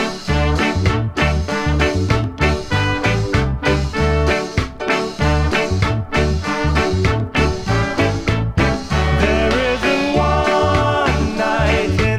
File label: xmas ska